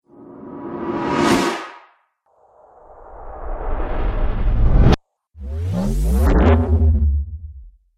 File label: Cinematic Riser Pack; Rise And Dive Sound Effects